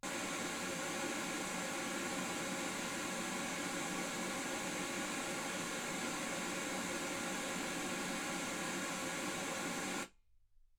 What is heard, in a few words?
wgn